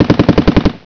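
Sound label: machine gun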